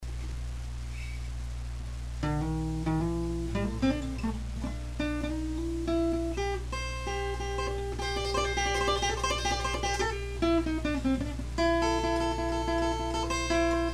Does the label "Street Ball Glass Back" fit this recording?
No